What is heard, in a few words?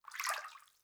Liquid Water Movement Bathtub